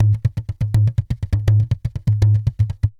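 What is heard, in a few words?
tantango groove; Best Service; World Colours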